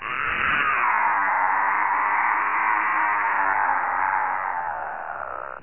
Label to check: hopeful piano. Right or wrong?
Wrong